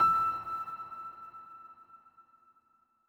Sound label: piano note; gamerip; Beacon Pines